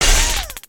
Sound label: Impact Wrench